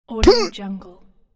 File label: punch with groan; sound ideas